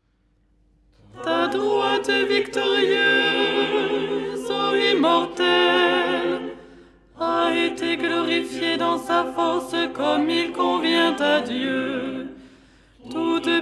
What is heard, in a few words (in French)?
alto; ton1